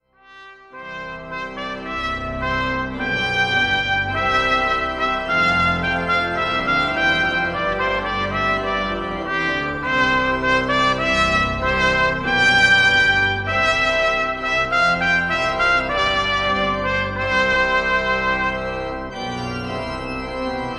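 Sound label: trumpet te deum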